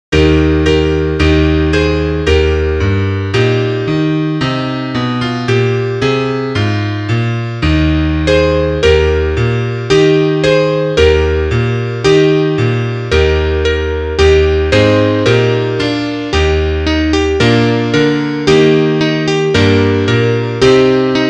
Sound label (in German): Klavier; Liederbuch; laterne laterne